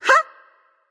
grunt attack; smite; voicepack; hel

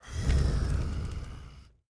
grunt; fenrir

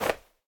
pwr sm fire cloth; smite; pele

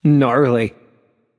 happy; smite; voicepack; osiris

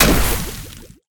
rain impact; smite; vulcan

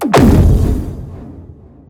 atlas pulse; smite; god atlas

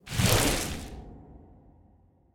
death portal close; argus; smite; hera

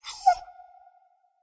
dog whine; smite; scylla

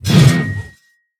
swing; smite; chaac

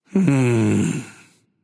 nv sigh; smite; voicepack; xbalanque

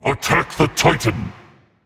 vox attack; smite

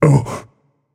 surprised; chiron

